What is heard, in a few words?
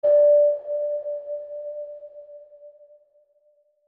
Tranquil Low Piano Ping